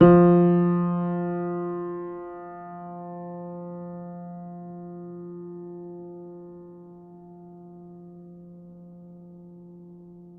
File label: Player, Upright Piano; HSS OP Pack; healing soundscapes